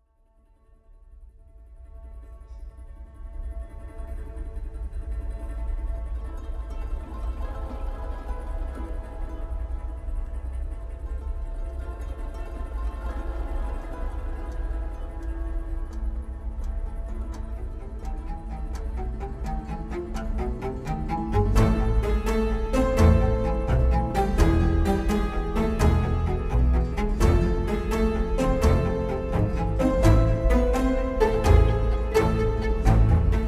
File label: Cinematic Action Music; inspired by Sherlock Holmes